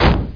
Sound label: JET P; Duke Nukem 3D Kill-A-Ton Collection